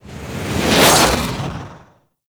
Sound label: Melee Swipe; Teralyst; Ropalolyst; Abilities; Melee Grab